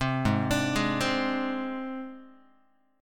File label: G sharp Major Flat 5th, Guitar; G#Mb5 arpeggiated; JGuitar